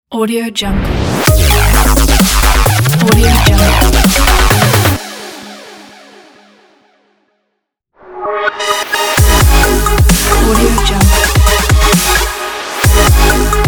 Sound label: futuristic dubstep logo pack